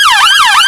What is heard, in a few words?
uk yelp, siren; gmod; svmod